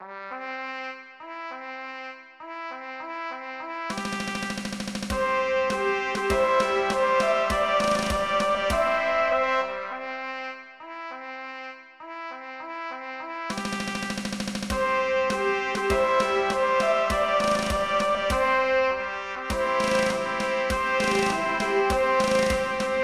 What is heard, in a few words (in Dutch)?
Trompetmars klein